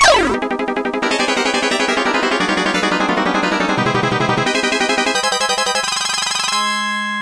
Melodic Jingle; bugs bunny s birthday ball; bally pinball soundtrack

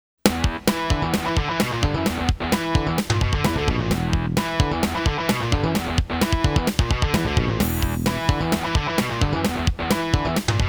Night Circus (Synth Version); Super Famicom Magazine Volume 21; New Game Sound Museum

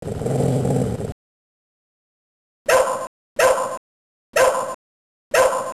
Ninja Dog (Title SFX); Shadow Dancer; arcade

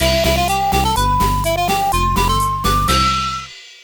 Jingle Mini Game win; game rip; little wingels; nds

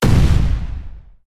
Wizard Firestorm Impact; Idle Champions of the Forgotten Realms

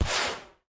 Rocket Launch

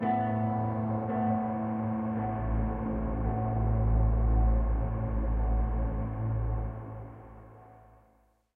Rescued Prisoner Stinger; E01 Caves

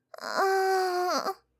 Moan; My Cute Commissar

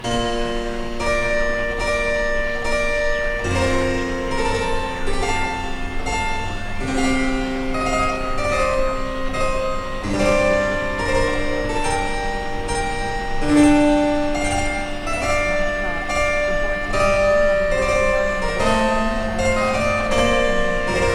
radio station; Dark Fall The Journal

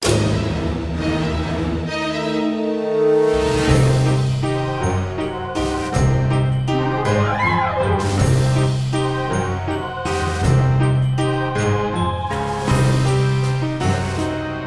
Level Music demented; South Park Tenorman's Revenge; Xbox 360

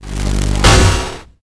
Metal Punch; gamerip; Idle Champions of the Forgotten Realms

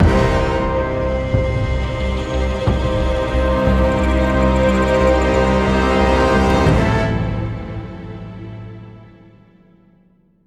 Action Swell Stinger; batman the enemy within

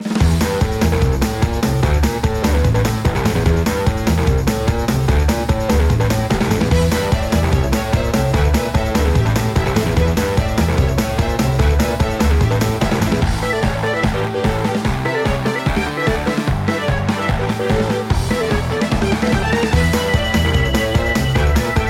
Fast Angry; Idle Manager; Android

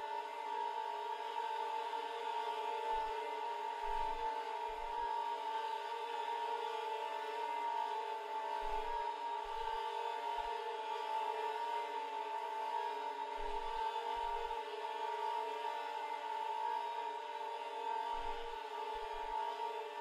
Quiet Strings; Solus Project

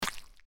Dirt Crush; My Cute Commissar

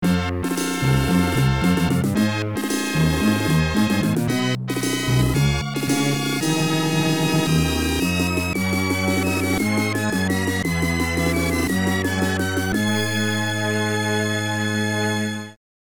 Stage Clear (Short)

